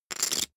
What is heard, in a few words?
poker chip stack big rattle